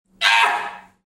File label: chirping; EAHHHHHHH!